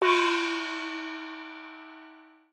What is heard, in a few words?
Normal Autospin; Shengxiao